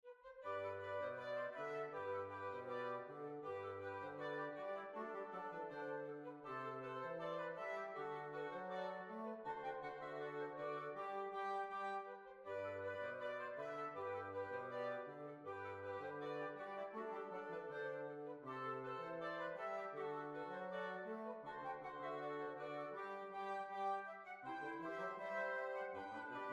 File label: Polka